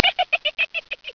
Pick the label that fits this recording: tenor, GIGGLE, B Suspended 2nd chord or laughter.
GIGGLE